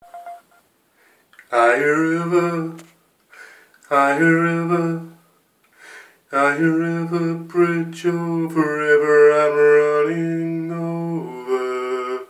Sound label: improvised song sketch